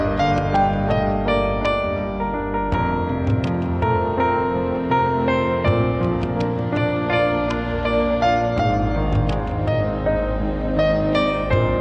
Instrumen

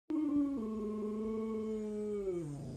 Howl

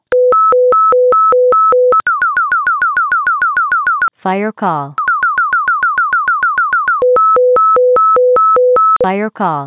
fire call chirp

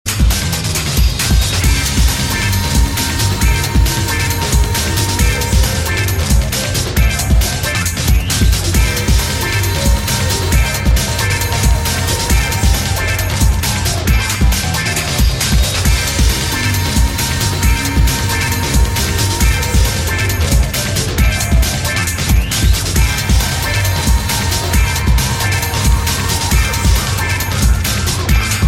Techno Mix; Tekken 3

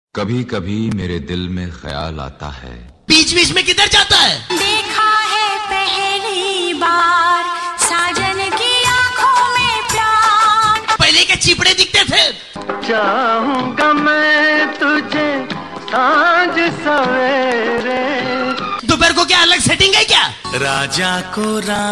Funny Remix

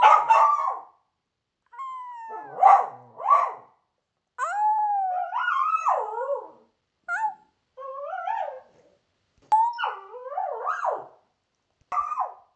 Howl

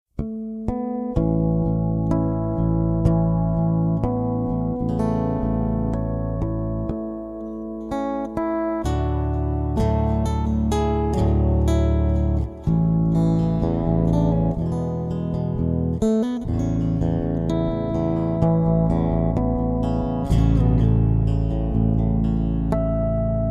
Electric Bass C; LOTR

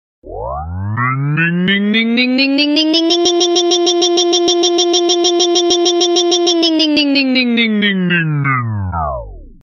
Vinyl Message

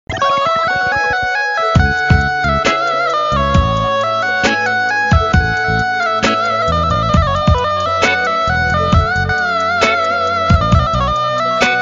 Arabic Tone

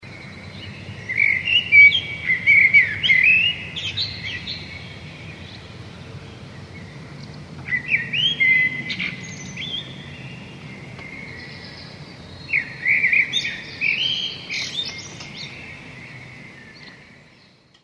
Morning Birds

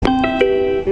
Train; Swedish

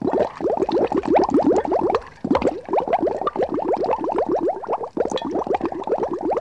Bubbles

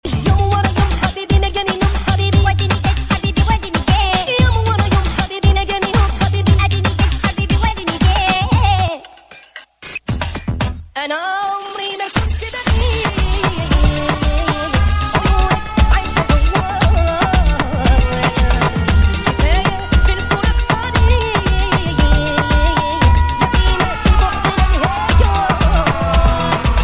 Arabic Megamix

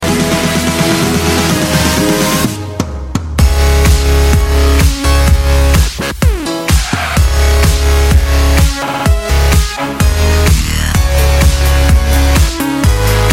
Dupstep

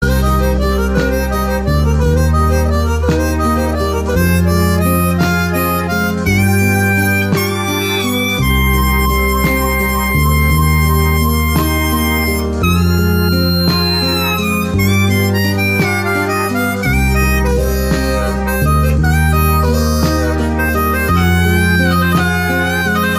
Improvisation Cm

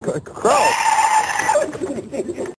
crow scream; Mst3k; meloboom

